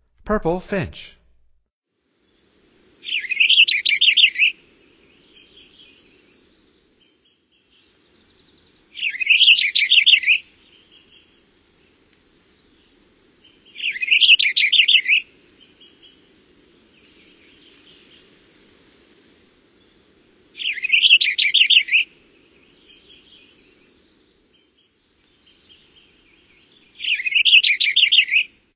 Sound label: Purple Finch, Songs of Birds of North America; Stokes